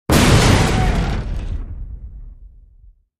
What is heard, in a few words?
Explosion Metal Deb, metal & glass